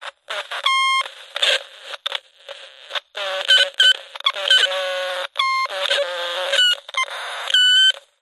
cb radio cheap static and feedback through speaker